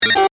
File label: Multimedia Inquiry, Technology, Multimedia, Inquiry, Element; Alerts